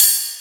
Ride Cymbal; Best Service; House Definition VOL-1; Cymbal Stuff

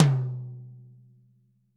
TT, Toms; Bob Clearmountain Drums I

